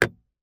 car door latch handle interior release; transportation

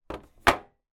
make up case down on hard surface; household

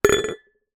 glass jar large down concrete ground; household

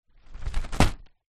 leisure kite nylon hit ground

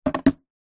camera dslr set down on table; technology; zapsplat

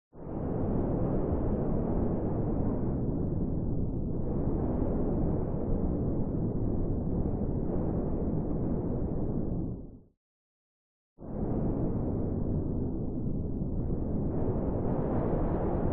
ABSTRACT WIND Soft Interior; smartsound

